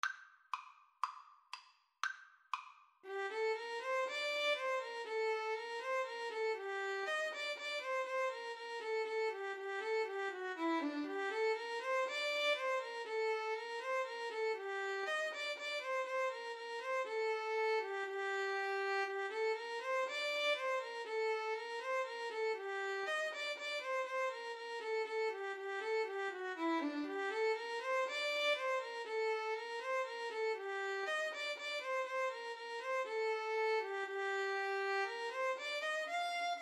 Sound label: gavotte violin